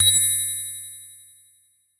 freeze

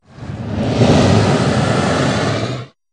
roar of beast; animal

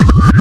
motion; animal

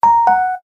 quiz right

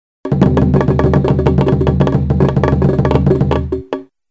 drum

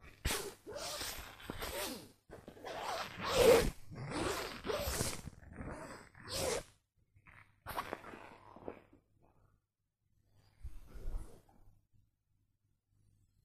rub the nylon; operation

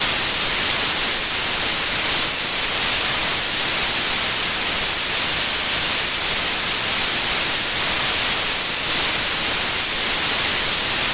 spray; operation

